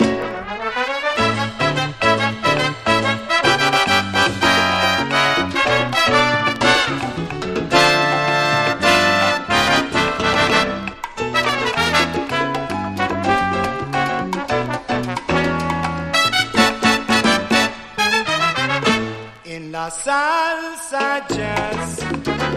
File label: SALSA JAZZ